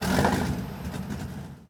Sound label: start engine, engines; World of Tanks